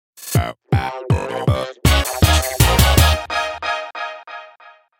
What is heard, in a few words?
Stage Clear; Splatoon